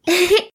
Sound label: Laugh; Voice Loot Filter and Voice Lines